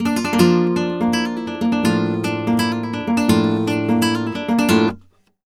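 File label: arpeggio guitar, Flamenco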